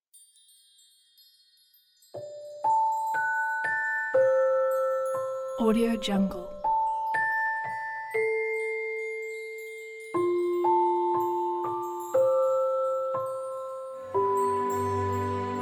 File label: magical christmas; bazzil